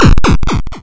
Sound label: death; spongebob squarepants extreme arcade of world records